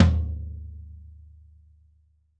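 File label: floor tom; drum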